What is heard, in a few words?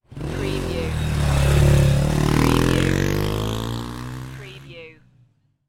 Motorcycle Dirt Bike Drive By Right To Left